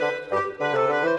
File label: Bassoon Clarinet, Orchestral; Sonic Foundry (Sony Creative Software); Orchestral VOL-2